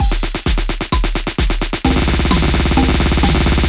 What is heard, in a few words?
snare fill